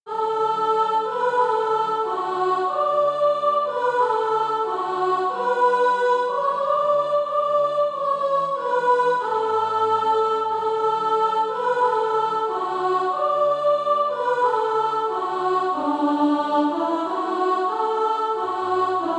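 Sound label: soprano, Practise Music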